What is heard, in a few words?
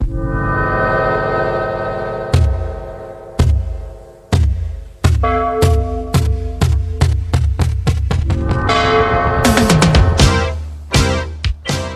intro music; freak fortress 2